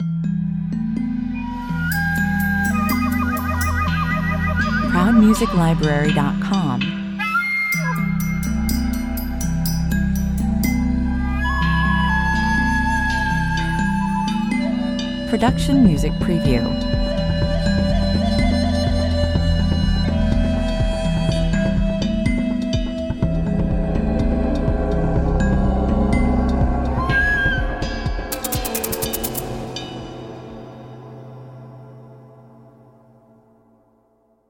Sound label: corporate kit (ethnic film) 124 bpm